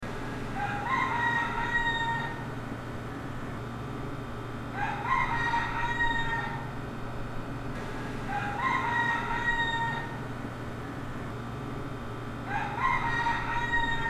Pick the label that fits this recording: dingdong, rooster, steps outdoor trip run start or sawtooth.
rooster